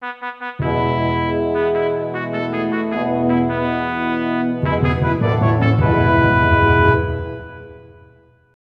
Fanfare Snag Machine; galeofdarkness

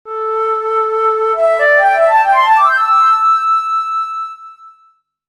Time Flute; Unused; galeofdarkness

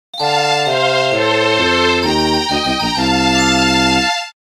evolution complete; stadium2

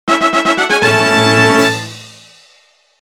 single minigame won; stadium2

